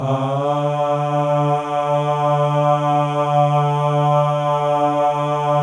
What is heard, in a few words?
MALE AHS, Symphony of Voices; Spectrasonics